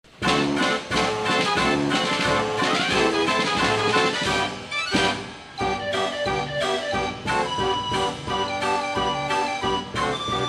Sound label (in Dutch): draaiorgel; de frans hals